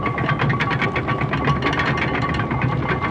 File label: Wheel machine